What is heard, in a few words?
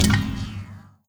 step light, footsteps, player, lightbridge